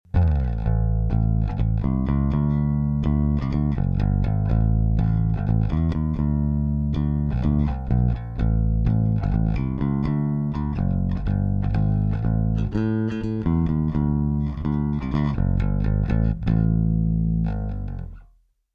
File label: cube-10gx bass